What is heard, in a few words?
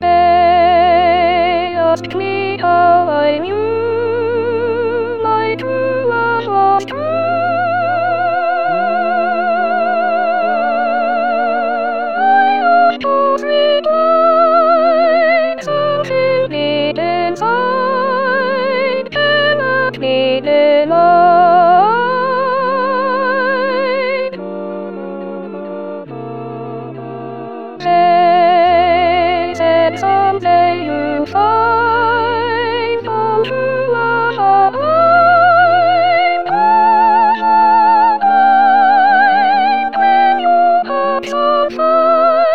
Sop solo